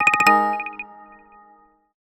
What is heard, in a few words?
Quartz Alert; Sony Ericsson; C901; PlusFourSix; Lars Edvall